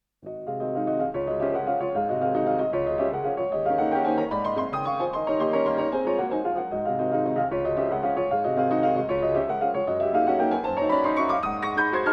Etudes in D Flat; Chopin; 12 Etudes, Op.25 - No.8

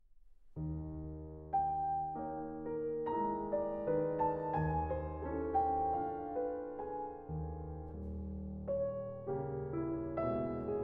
Studies, Andante espressivo; Etude

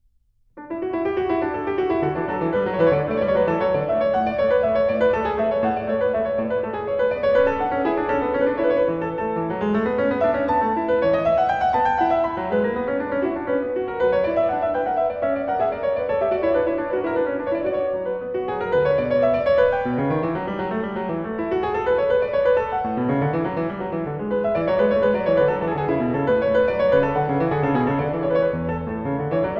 Invention in D Major; Invention No. 3; BWV 774; Bach Inventions & Sinfonias, BWV 772-801

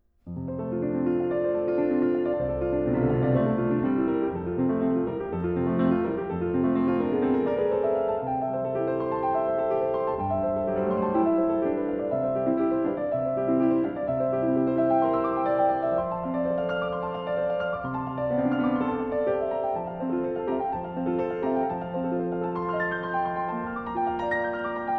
Préludes in F Major; Chopin Preludes

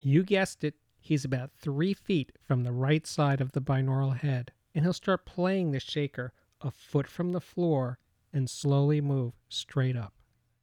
Height Right Channel Shaker Announcement; The Ultimate Headphone Demonstration Disc ( 24Bit192kHz ); Chesky Record

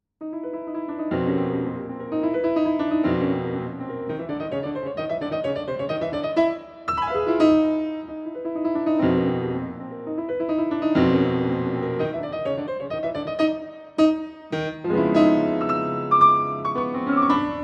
(Arr. For Piano); III. The Autumn Fairy; Ten Pieces from Cinderella Op. 97

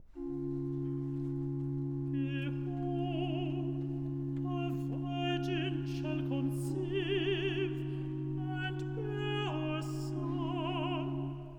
Alto; Part 1 Behold, a virgin shall conceive; Handel Messiah, HWV 56

